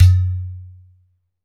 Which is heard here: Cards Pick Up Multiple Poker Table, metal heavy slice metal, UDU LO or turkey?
UDU LO